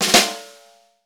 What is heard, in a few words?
snare, Roland; Club-50 - Foundations Roland; Ext.Snare 4